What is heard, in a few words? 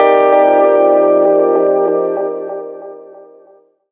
RHODZ; Rawcutz - Nu Forms